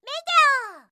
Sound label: meteor; fantasy